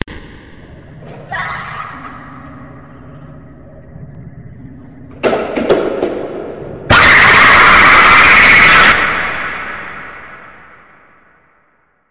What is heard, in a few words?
screaming baby evil